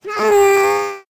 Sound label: Jump